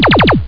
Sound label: lazer; PaintIt